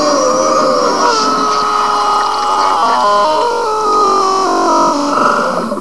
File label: scream